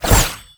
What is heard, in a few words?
Kunai Whoosh Sweetener; Weapons